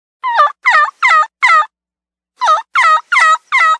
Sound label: Spring Turkey